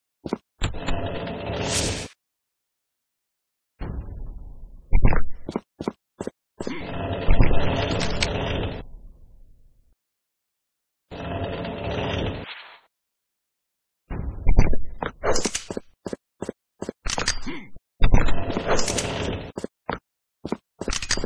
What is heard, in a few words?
rotating doors; openarena